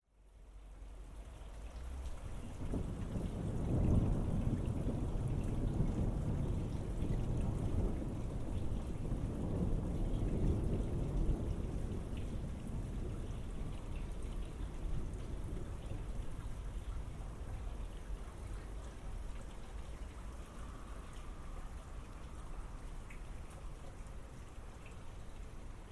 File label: storm passing by